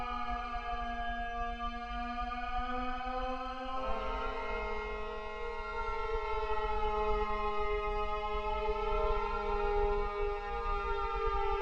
meditation

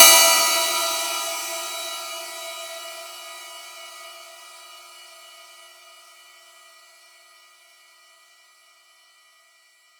cymbal

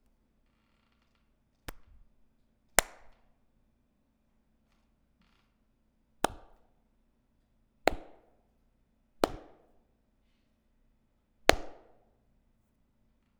clap

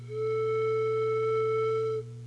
plastic Flute

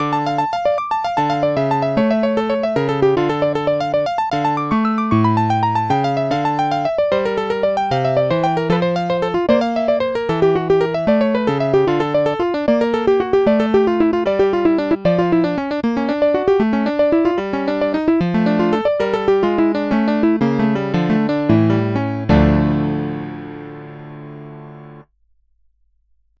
bach synth

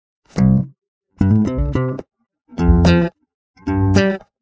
bass

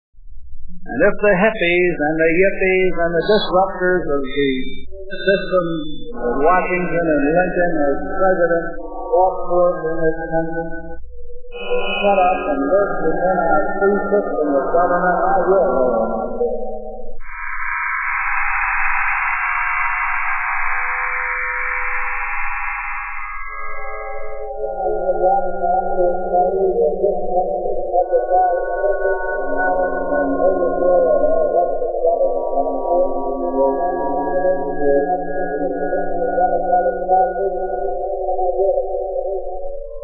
8bit

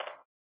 double Knock

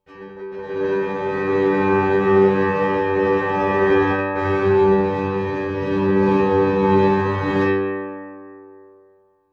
bowing out stereo

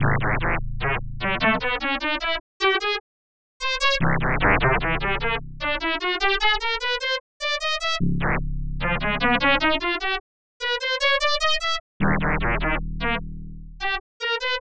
slow

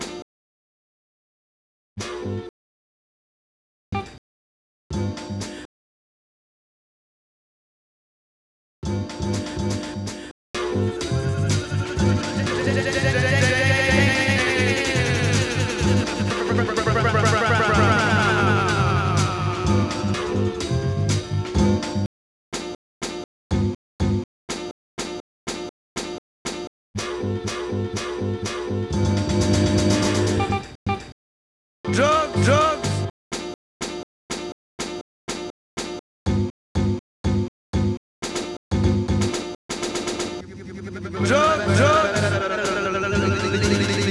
rhythm

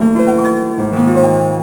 piano

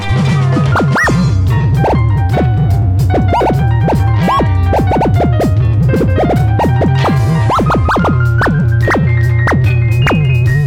rhythm

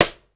snare

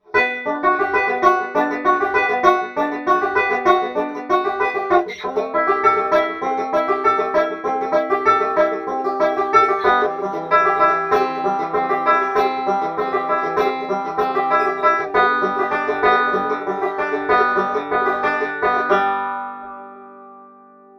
banjo piezo filt through invfz

